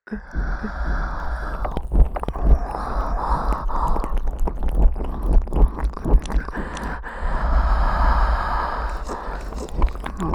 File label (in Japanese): 耳舐め